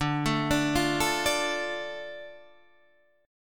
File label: D Minor D Guitar Standard; 10,12,12,10,10,10; Dm arpeggiated